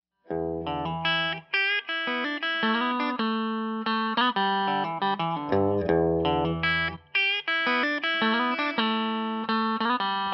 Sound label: middle, custom pickups